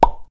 item drop pickup

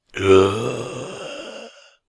yeti death; server-nalc; mobs

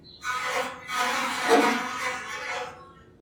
flies; ambience; dfcaverns

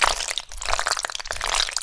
oil footstep; dfcaverns

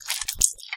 nether extract blood; nether-pack

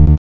cannot

